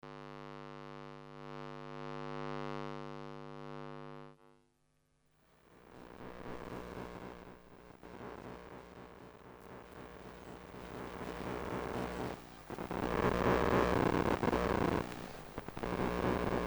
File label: heartbeat